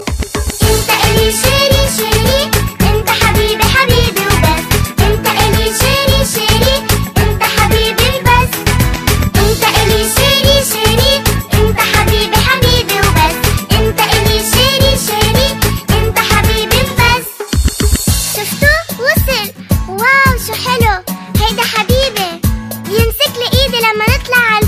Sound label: Little Child Sings